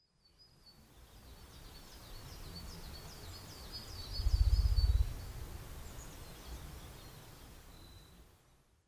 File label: Syrmaticus soemmerringii; ヤマドリ; キジ科; 日光市稲荷川上流 alt=1330m; Rec: EDIROL R-09; Mic: built-in Mic.; 羽をふるわせとても低い音で縄張りを主張します。